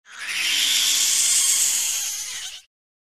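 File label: Animal Stinger Eerie; stingers & whooshes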